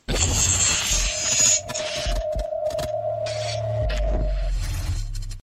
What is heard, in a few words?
Electrical Sparks